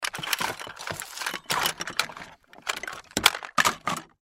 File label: Cardboard Box Junk; SoundEffects-Blastwave